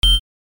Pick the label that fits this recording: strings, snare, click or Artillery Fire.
click